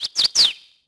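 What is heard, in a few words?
ambience bird; Tony Hawk's Project 8; XBOX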